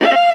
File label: Viola Missednote; Levels Hub Bayou 03 Music Riverboat